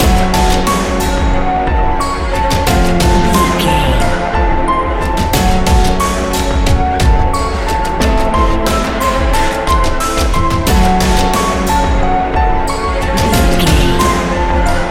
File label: modern horror; Video Game